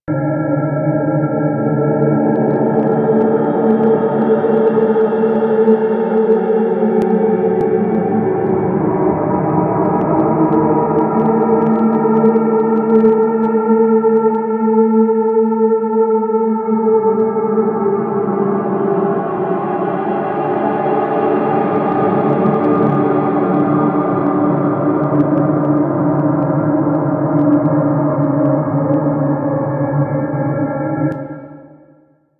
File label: Moan Amb B; Ambience; Interior; Warframe; Orokin